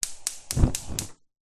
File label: household cooker oven hob spark ignite; zapsplat